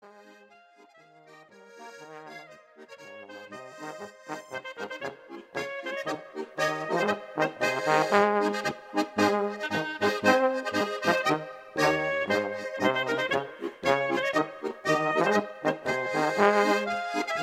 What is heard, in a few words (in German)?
Marsch